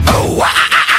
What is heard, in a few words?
Oouuwwwaaaa